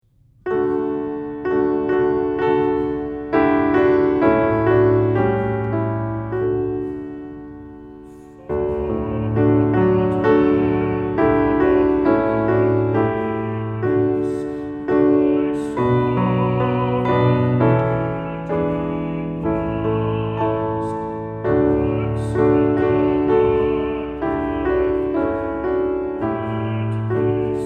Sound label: Piano; Father Whate'er Of Earthly Bliss; piano accompaniment pieces